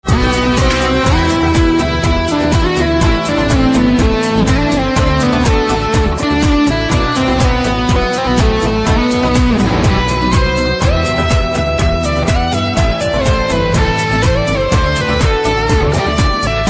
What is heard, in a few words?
Electric Guitar